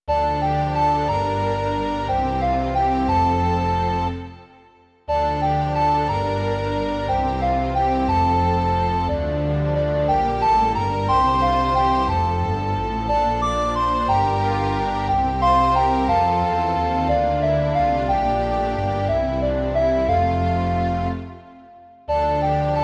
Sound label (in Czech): 2 zobcové flétny se smyčcovým orchestrem; Sláva, sláva na výsostech Bohu; Imaginativní verze